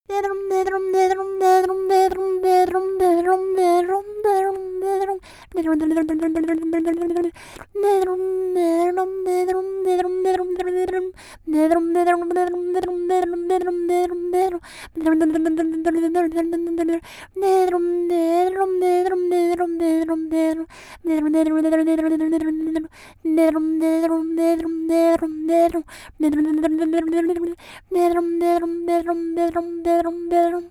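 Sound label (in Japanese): 少女「フェラ」レロレロと声に出す30秒; 元気な少女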